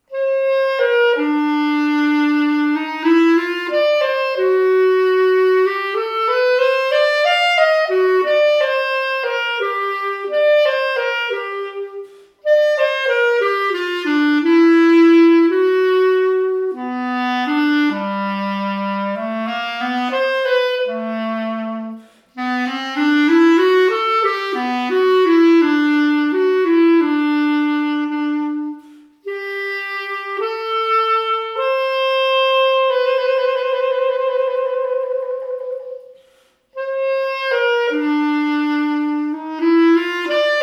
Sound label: waltz; independent